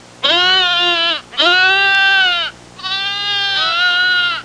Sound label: Goat herd bleating